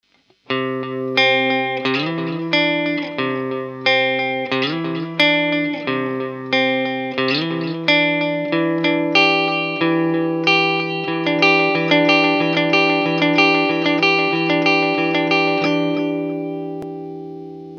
Echo platter

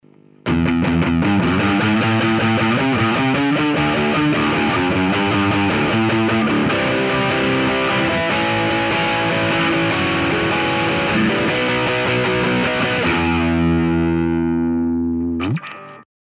Line 6 Drive

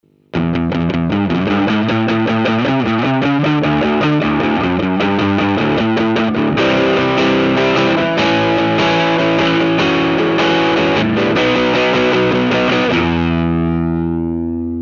Overdrive

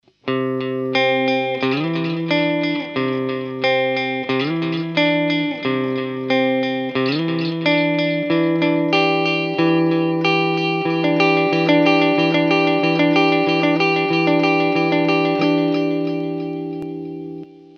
Tape echo

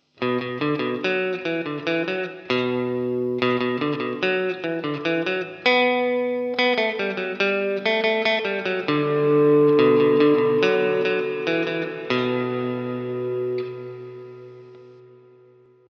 Chamber

